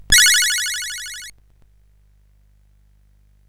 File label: sid CSG8580R5; hvsc